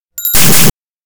cafe door chime; anemoi

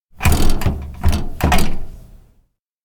door observatory locked; anemoi

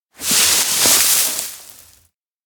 bush part; anemoi

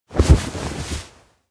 grab pull arm; awayuki; anemoi パッケージ版 1.1

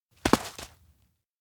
steps outdoor land; jet; anemoi

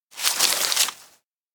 bamboo leaves multi; anemoi

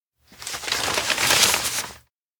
docs flip search; anemoi

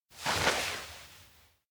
spin; rikka; anemoi

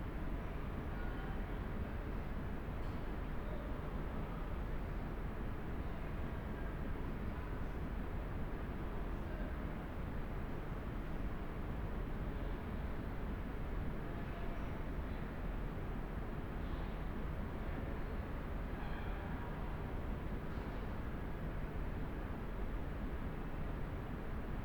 indoor observatory; LOOP; anemoi

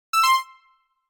pingpong; kyplay; anemoi パッケージ版

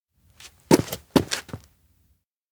steps turn toward; minato; anemoi

